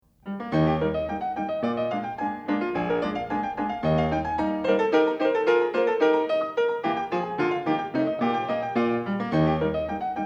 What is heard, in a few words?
Variations For Piano